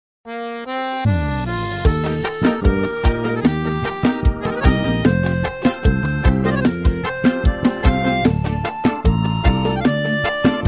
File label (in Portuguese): Playback Versao Forro; forro cristao sax alto eb